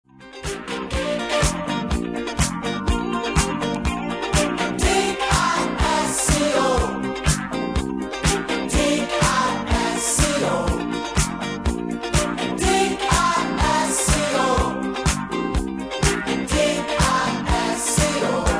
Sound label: disco